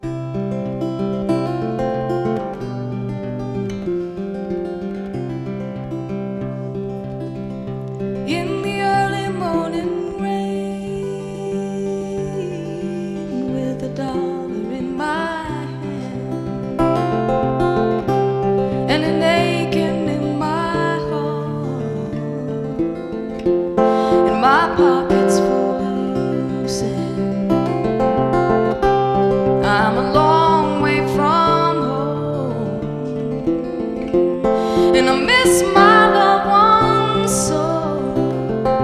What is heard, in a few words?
acoustic